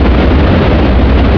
machine; PC Gamer IT CD 41; Daikatana

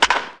bat hard; Hardball 6

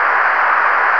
noise; JSF demo

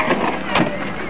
close door

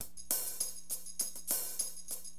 DM LOOP, HipHop, Drums

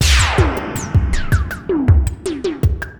DRUM LOOP ambient 160

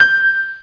grand piano G3; Grand piano G6; Star Trek JR Audio

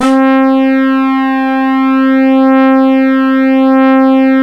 Chiffer Lead; GMPlay; Synth lead

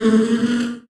drone; kult

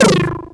twitch; HDD Thermometer v1.2

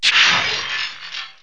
glass exp, explode; KABOOM! - Wild and Wacky Sound Software; citylife

